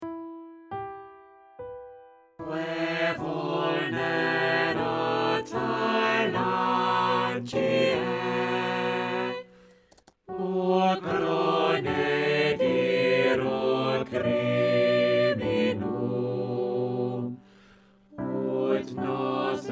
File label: bass boost